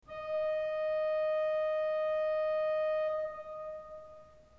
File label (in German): ton, orgel